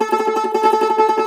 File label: Cumbus Saz